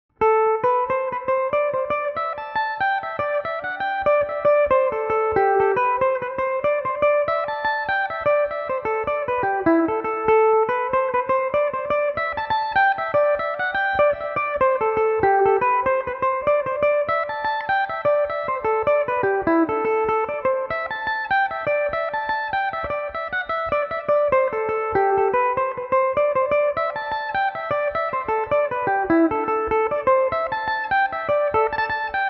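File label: A dorian, irish mandolin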